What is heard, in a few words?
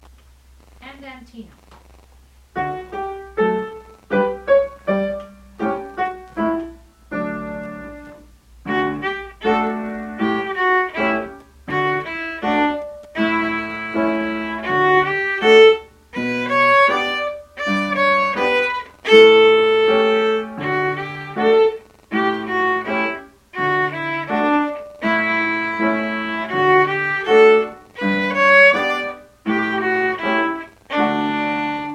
andantino, tune; Strings; Practice Recordings; Level 1A